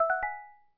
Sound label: Phone On; Samsung SGH-i740; System Sounds